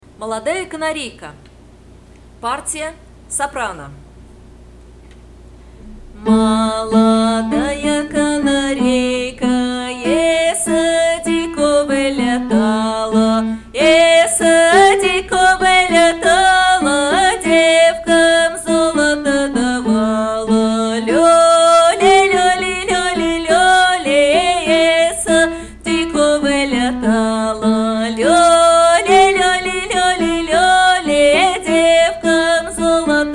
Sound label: soprano; molodaya kanareika